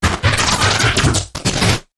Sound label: Interrupted Static